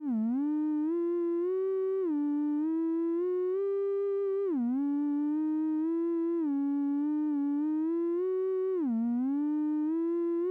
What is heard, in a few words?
Theremin Sound Sample